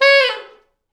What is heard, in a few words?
T Sax falls